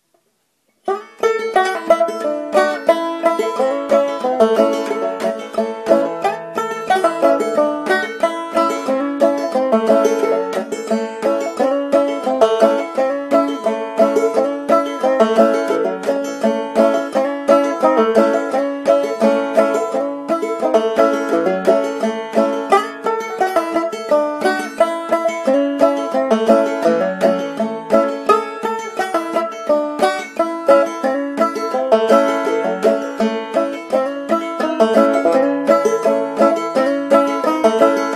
frailing, banjo

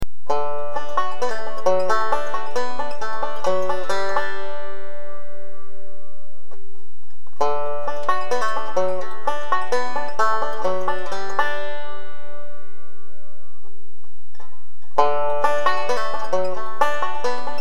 d lick, banjo

